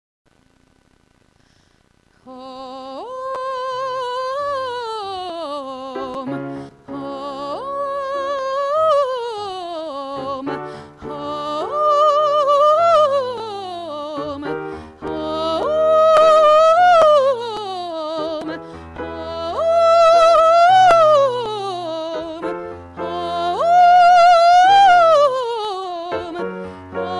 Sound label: Warmup, Vocalization